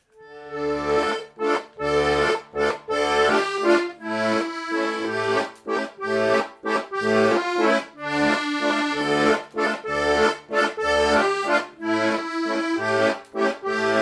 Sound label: Tombolaika